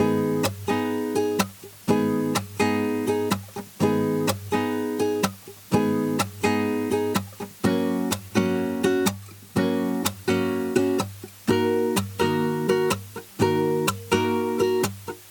4 4 2, nylon, Guitar

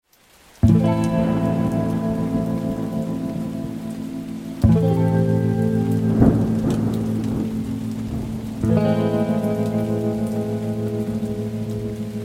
strum electric guitar; beatcraftpro

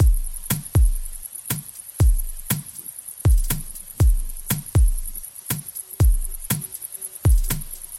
loop pop, drum loop

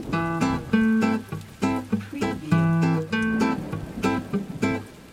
Guitar 2-4 Staccato 100BPM Am, nylon guitar; beatcraftpro

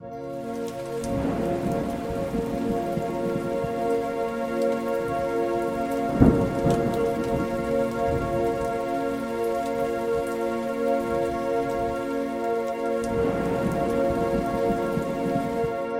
ambient pad; Eclipse

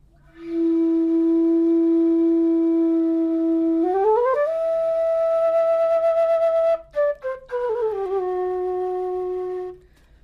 bansuri

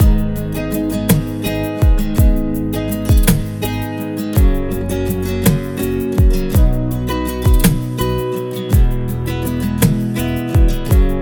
6-8 Slow 110bpm, nylon

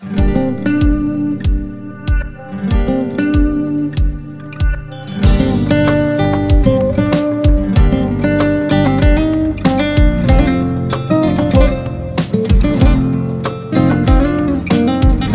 Just Melody; milad; Guitar

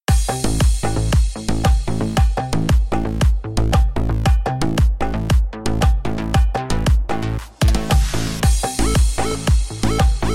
tribal remix

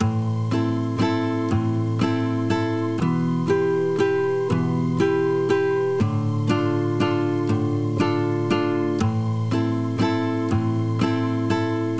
3/4 pick, nylon, Guitar; ریتم ۳/۴ گیتار نایلون

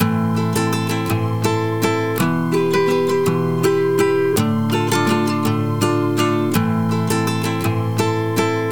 6 8 slow rock, Guitar

